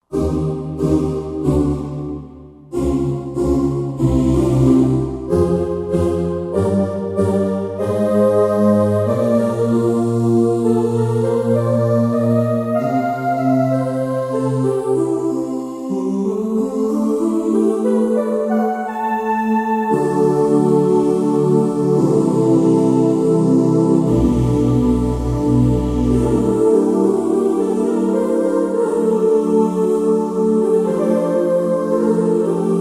Angels Sing; Roland JV-2080

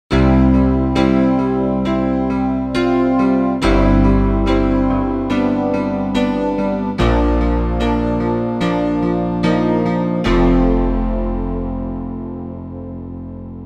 MIDIed Grand, Roland JV-880; Factory Patches

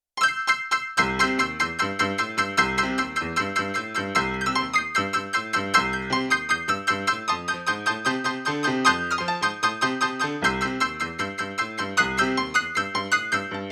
Rock & Roll, Yamaha CFIII Grand Piano; Yamaha TX16W

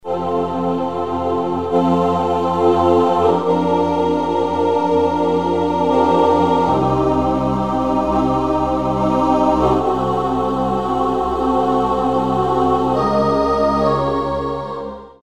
Choir Pad; Generalmusic pRP8; Preset demos

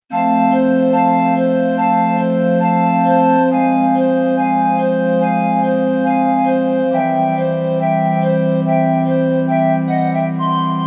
Flute Pipe, Kurzweil K2000; Kurzweil K2000 Value Pack; Extra Programs

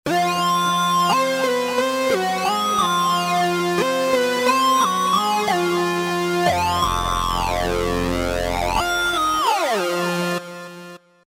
War Drone, Roland V-Synth; Factory Patches

